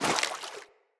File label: Grunt Step Water, footsteps; Enemy; Characters; Corrupted Sproutfolk